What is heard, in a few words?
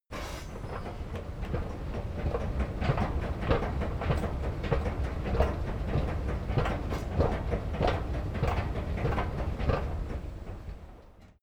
escalator short